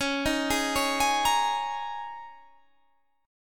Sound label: G sharp Suspended 2nd Suspended 4th C sharp, guitar